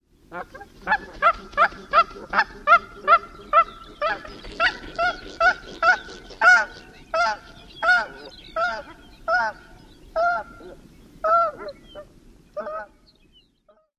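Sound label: flight of individual, Cackling Goose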